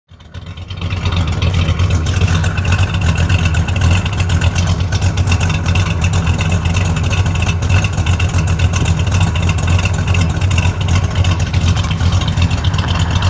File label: ranchero idling, 1970 Ford Ranchero